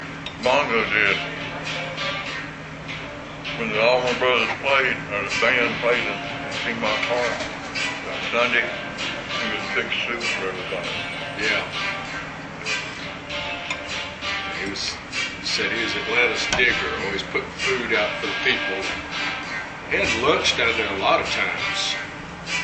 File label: Bongo